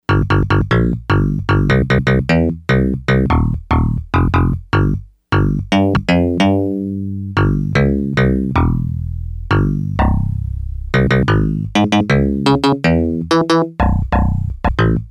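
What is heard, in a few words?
Electric Bass, Yamaha DX100; Factory Patches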